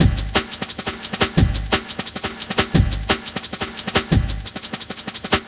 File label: 175, classic dnb breaks